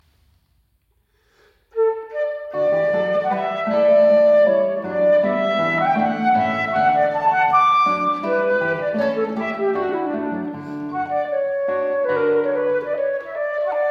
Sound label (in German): Sonate D Dur Allegretto; C. Ph. E. Bach